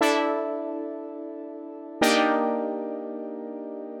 120 Pad Chords C, Keys, Nu Jazz House; PowerFX

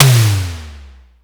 Simmons Tom

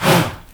"HA", Heart of Africa, shout; Spectrasonics; Heart of Africa 1; CH. SHOUT1

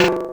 Bent Perc; glitch; Bent Hits

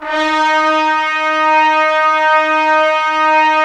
Trumpets; Roland - LA Composer vol. 4

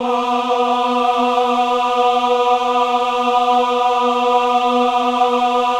VIB B3, VIBRATO, Voices; Spectrasonics

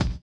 collision; Spectrasonics; Metamorphosis

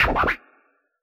SCR, Yamaha DJX, B900 Kit

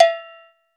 ChaCha Cowbell; Roland BK-5; Percussion

